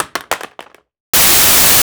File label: Drop Remote Control On Tiles; The Sound Pack Tree; Dropping Things